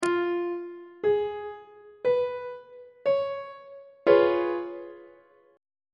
c sharp dominant 7th 1st inversion on treble clef

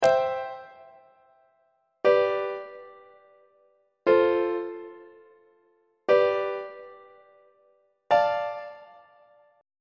b sharp major deceptive cadence on treble clef

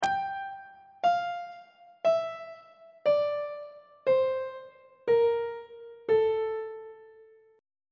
a phrygian mode descending on treble clef

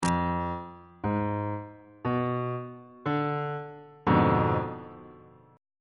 d diminished 7th 1st inversion; bass clef

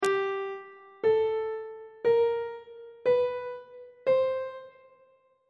f double sharp 3rd intervals; on treble clef

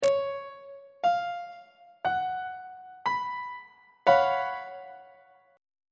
g flat major 7th suspended 4th 2nd inversion; on treble clef

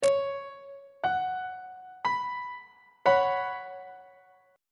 b suspended 2nd 1st inversion on treble clef

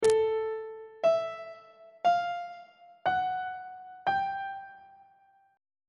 a 6th intervals on treble clef

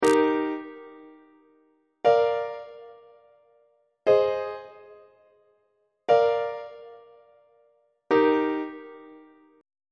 d sharp major plagal cadence on treble clef

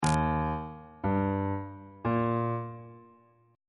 f dorian chord 7 is e flat major on bass clef

